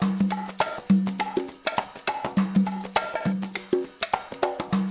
LOOP, Percussion

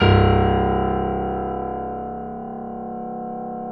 PNO F; Synclavier Sampler Library

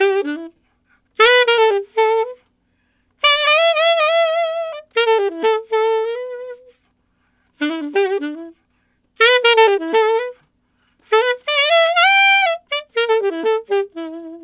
Alto Rock in E maj, Sax; Sonic Foundry (Sony Creative Software)

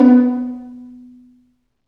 String Vlns Pizz

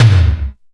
DANCE SET, DRUMS

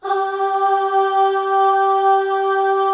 Voices, FEM AHS; Spectrasonics; FEM.2 AHS

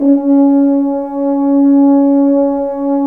BRS, FHns Dry; Roland L-CD702; F.Horns 2

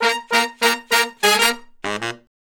Horn Section

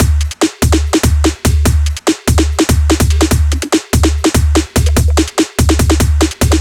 145 drum loops; HelloKrupa; M8; Electronisounds

